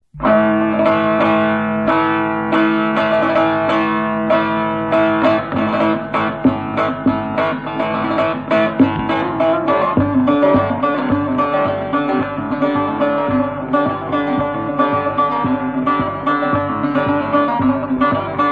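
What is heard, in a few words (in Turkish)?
bağlama, halk çalgıları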